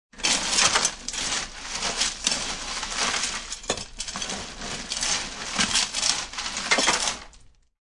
Ice Machine Dig, Digging in an ice machine; Human

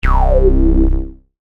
Synth, Sweeper; Production Element; Sweepers (Sound Effects:Sound Design); BlastWave

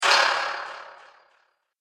Fragmented Magical Impact; Magic Sound Effect; Andrew Fleming

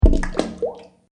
Water Puddle Splat; Water Sound Effects; nature

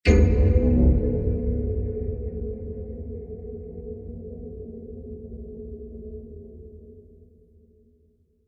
Sci-Fi Impact With Spooky Elements

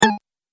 Multimedia Button

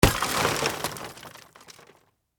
Impact Automobile Window, Shatter; Crash Sound Effects; Crashes; Objects

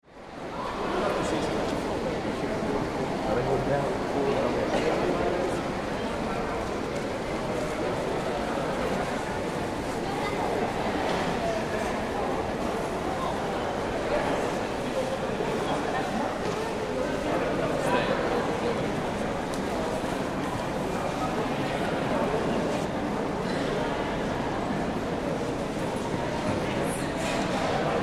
Ambience Train Station Switzerland Europe

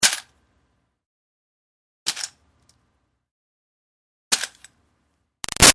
Foley Coins Bump Metal Bucket; Money Sound Effects; Objects; Big Room Sound

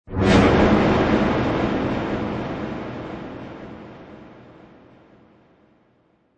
Magical Whoosh Airy Reverb; Magic sound of casting spell, conjuring or wizardry. Witchcraft ritual summoning elemental or othe; Whoosh Sound Effects

